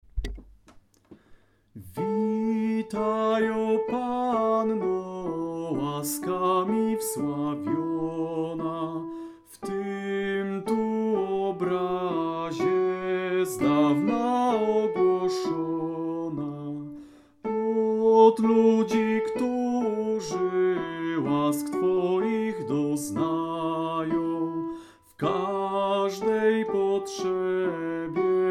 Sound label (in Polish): Witaj panno sopran